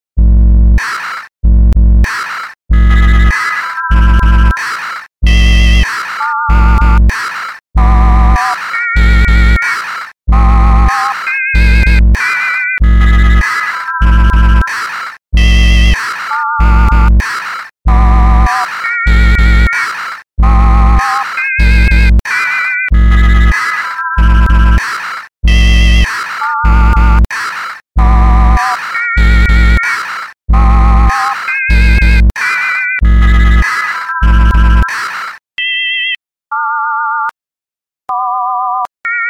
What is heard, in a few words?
Sinewave